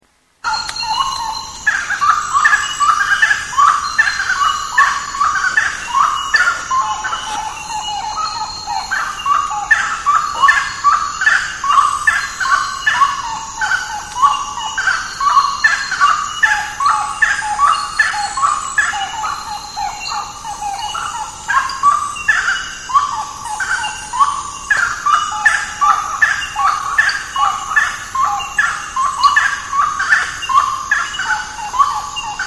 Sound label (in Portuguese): saracura